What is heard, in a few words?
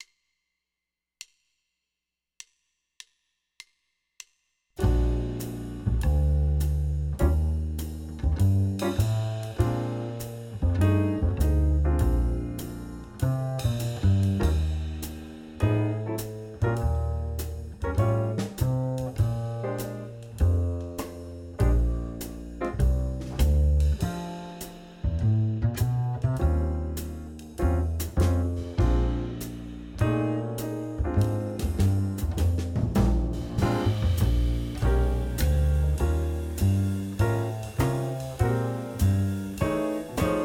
Jazz Etude; track only; Etude #1 track only